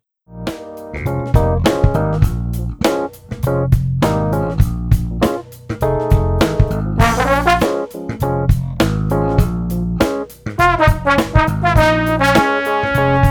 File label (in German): Luftzug Bb Phunk, Jazz-Posaune; Welcome to Barnstedt; Jazz-Posaune ist schon lange das Soloinstrument neben Saxophon und Trompete.